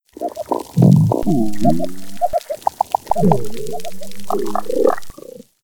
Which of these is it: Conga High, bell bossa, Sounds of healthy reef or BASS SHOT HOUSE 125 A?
Sounds of healthy reef